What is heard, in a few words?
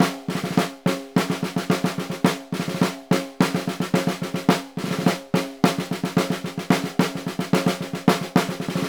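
snare, Synclavier Percussion; ILIO; Synclavier Percussion 2; World & Orchestral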